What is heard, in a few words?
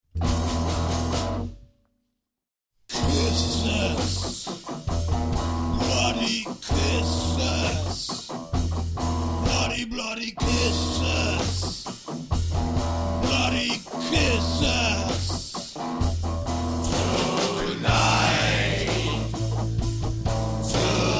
Death Metal